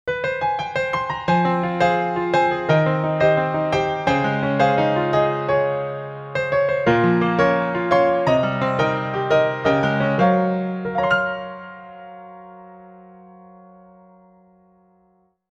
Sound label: BGM Elegant